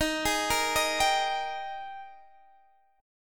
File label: Ab Major 7th Suspended 2nd Eb